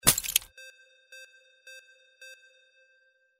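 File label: impact ice break; windy beach audio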